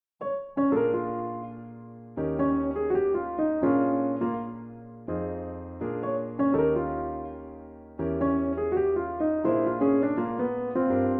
Blues, jazz chart; a blues theme jazz chart and big band arrangement; First Blues Exercise

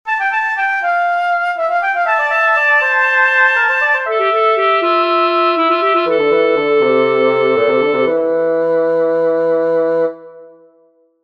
Fugato Unaccompanied

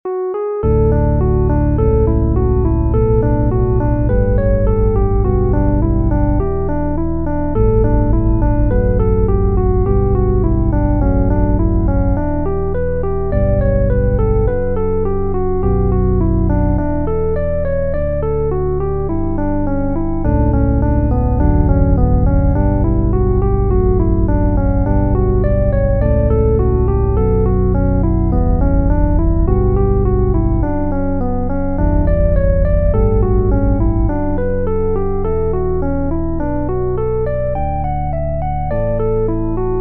christmas tune

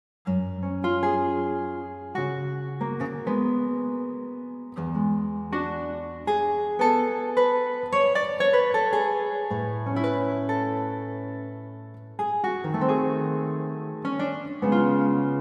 Guitar piece

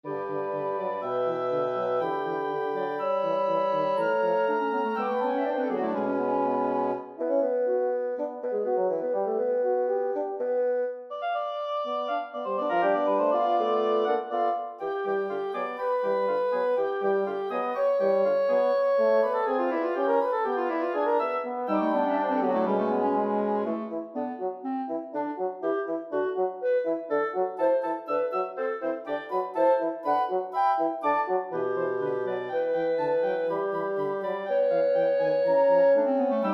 Woodwind Quintet; Through the Looking Glass

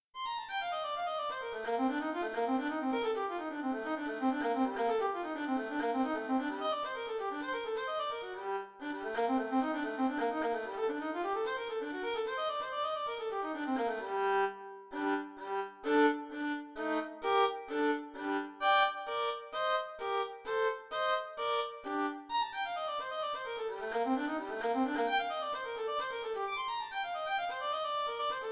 Caprice for Solo Violin

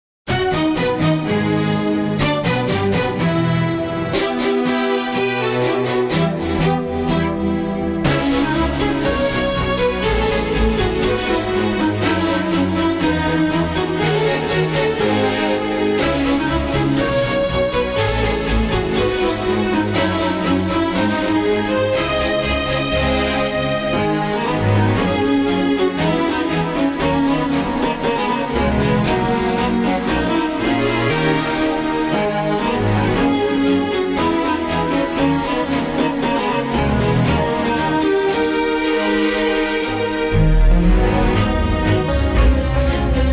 chamber maid melody; CHAMBER MAID MELODY 1 by Mavell; lovely brass solos

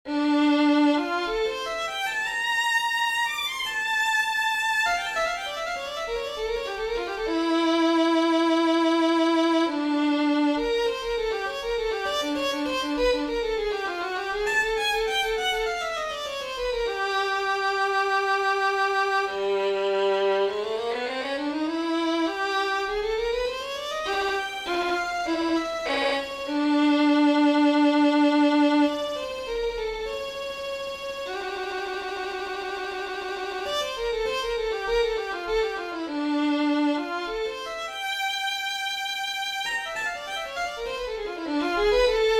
Solo Violin; From Beyond the Grave

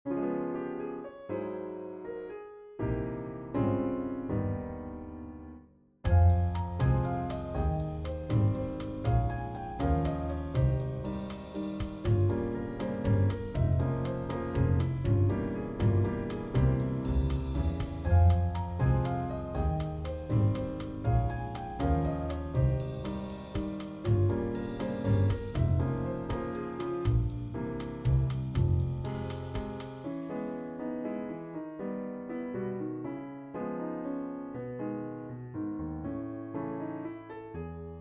Bach but its a Jazz Trio; beethoven and bach but with a jazz trio

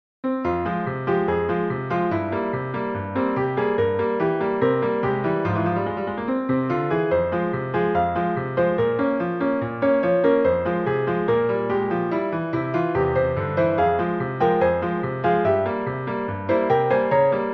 Russian March

